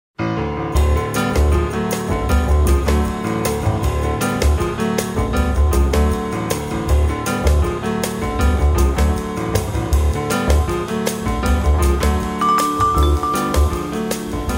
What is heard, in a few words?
Quartet, Groove